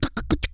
machine lips; Spectrasonics; Vocal Planet; Blues Soul

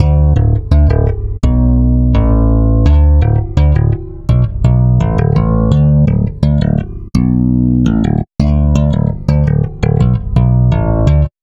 Stick Loop, Stick Bass

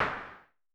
SN Filtered, Vermona Mono15 Drums Snare

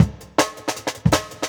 Jungle Breaks; Original Jungle Breaks

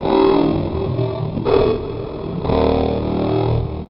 Industrial Bass, Drum N Bass; Sonic Foundry (Sony Creative Software); Knutrix Drum & Bass

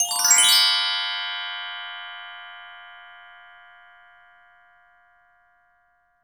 BL GLUP, orchestra; Best Service; Peter Siedlaczek's Advanced Orchestra

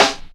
Snare, Percussion Hits, Snares; Jungle; Zero-G Jungle Warfare 1, 2 & 3; 90 s Sample CDs

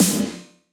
Snare, Gated Reverb; musicradar

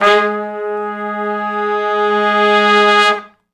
Long Note w Swell (Ab) unison, Horncraft for R&B; Sections

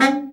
BRA Bari, SAX; Best Service Gigapack 1; BR SAX 2

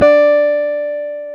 Jazz Guitar

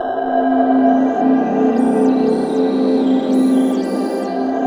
WS DA C 4, Korg Wavestation; Back In Time Records; Korg Universe VOL-1

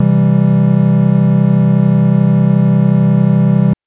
Synth Triangle maj c2; TrashLibrary

